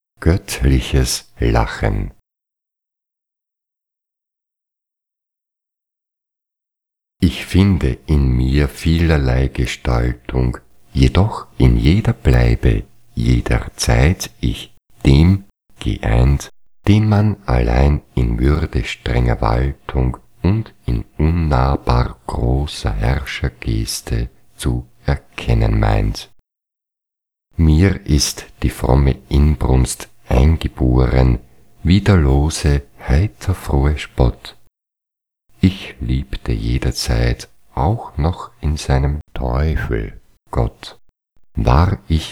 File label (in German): Goettliches Lachen